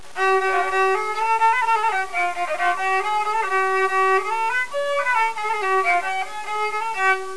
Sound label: instrument fiddle turkey